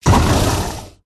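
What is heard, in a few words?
worm block; chervj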